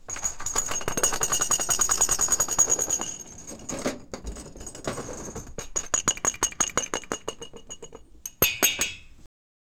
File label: violent rattling of small; earthquake